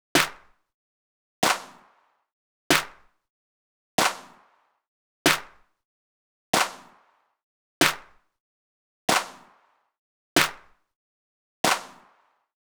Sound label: claps